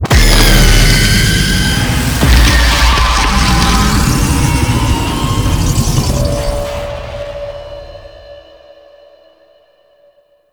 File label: Sentient Condrix Escape; Squad Link